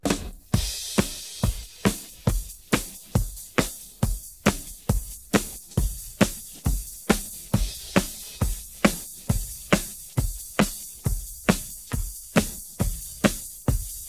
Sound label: Anytime Drums